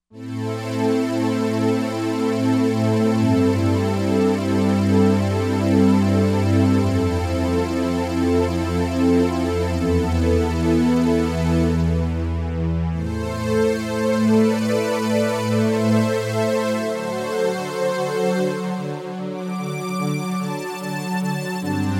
PW Pad

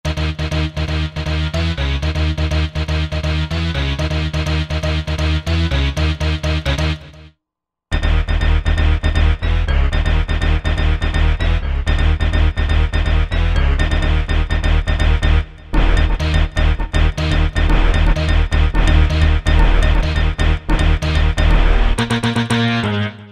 Rave Attack, Roland JV-2080

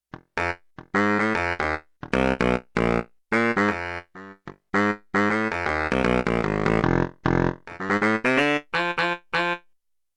Baritone, Big Band; Yamaha TX16W; Big Band Performance

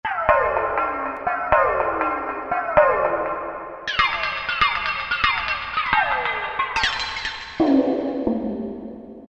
Flexatone, Korg M1; Factory preset examples

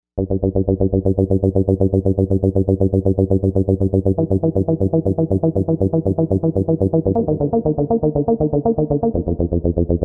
Tranzition Arp, Novation UltraNova; Factory Patches

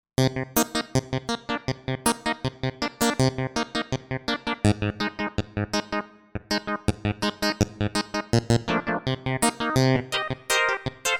Pulse Clavi, synth

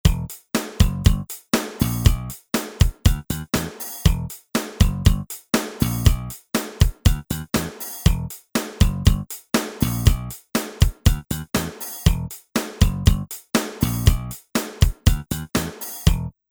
Funk

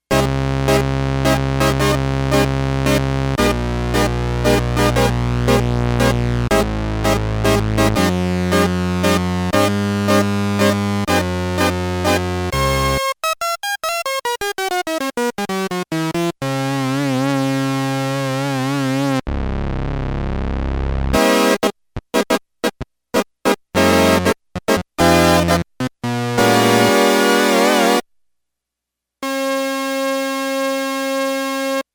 Octave Sawteeth

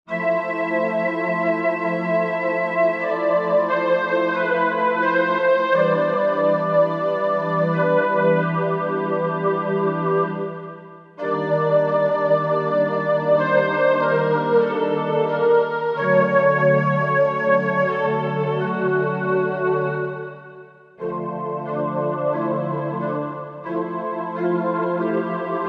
Wind Pad; Korg MSC-1S Variety

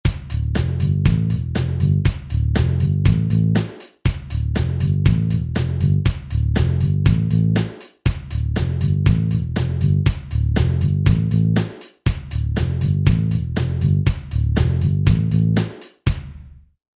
Rock

